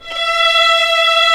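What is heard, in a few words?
strings, 8pc; String Master Series; Vlns 2-5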